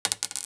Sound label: penny, clattering